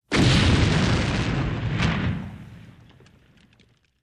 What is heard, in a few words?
Fire Explosion near